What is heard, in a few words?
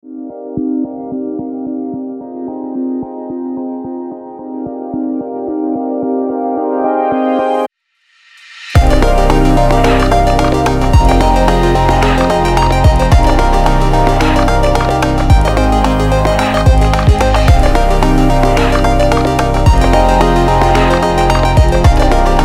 electronic minimal background music